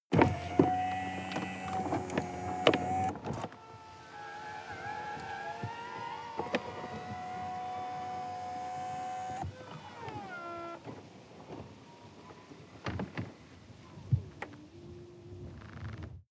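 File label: sports car interior convertible top open; verkehr-transport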